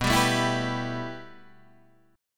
B 7th Suspended 4th Sharp 5th, Guitar, B7sus4#5 chord; strummed; Standard; JGuitar